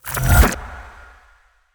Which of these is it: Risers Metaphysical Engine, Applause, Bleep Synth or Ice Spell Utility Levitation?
Ice Spell Utility Levitation